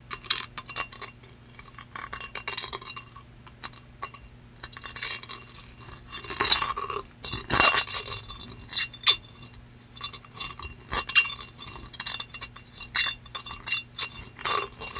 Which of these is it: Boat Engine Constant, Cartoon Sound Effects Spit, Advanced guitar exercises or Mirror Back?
Mirror Back